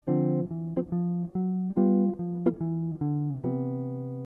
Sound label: bass